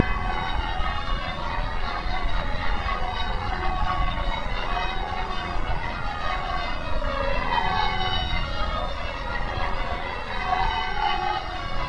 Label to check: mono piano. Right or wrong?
Wrong